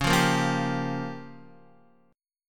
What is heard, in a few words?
C sharp 6th Flat 5th C sharp down Guitar; strummed; C#6b5; JGuitar